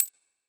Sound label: count Up; netent